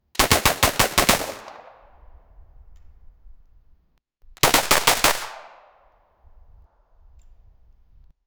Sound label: realistic glock 19 pistol